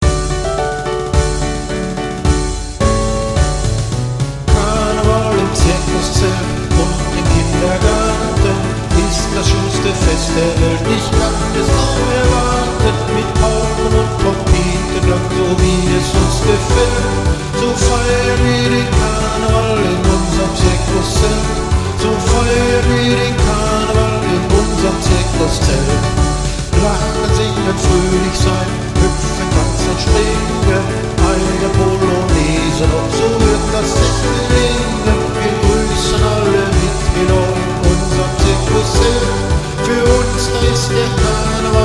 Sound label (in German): zirkuslieder